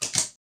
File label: slime; water splash slime sfx